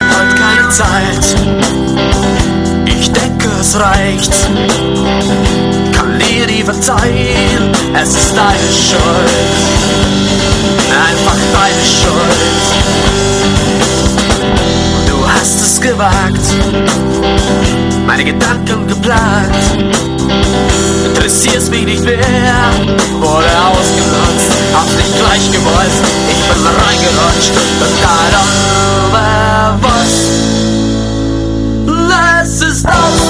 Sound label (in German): vulkan